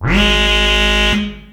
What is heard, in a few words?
alert, Synths; Notes